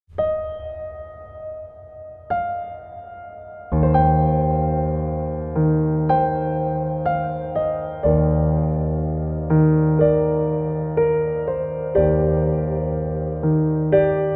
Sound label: piano solo; Le grand bleu